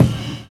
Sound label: live kick; Univers Sons - Kitch 70s